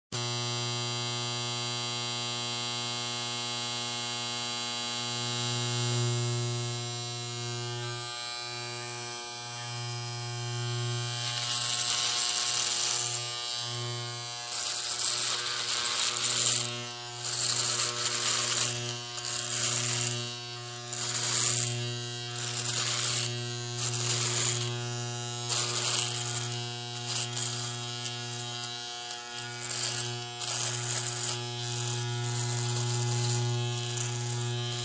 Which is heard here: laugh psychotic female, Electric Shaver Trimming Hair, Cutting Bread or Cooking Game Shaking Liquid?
Electric Shaver Trimming Hair